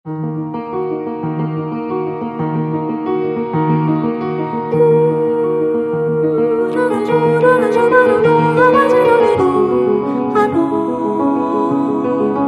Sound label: piano studio